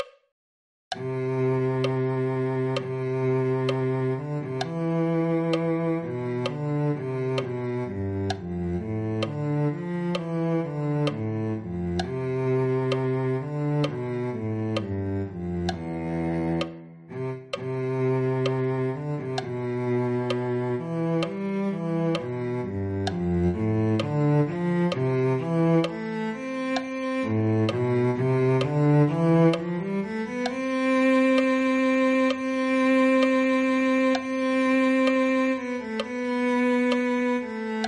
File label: cello